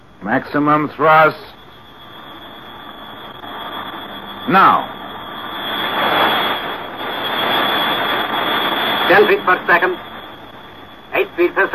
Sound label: Ship landing